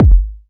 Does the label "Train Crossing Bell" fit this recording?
No